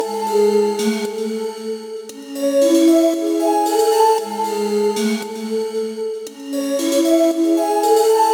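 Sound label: 115 A major Seq Bottles; Zero-G; Future Wave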